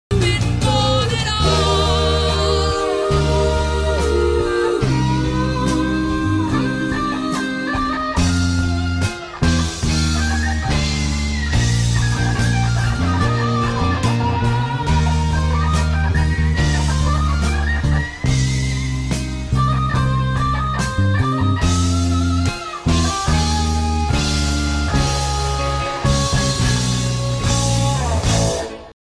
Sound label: guitar solo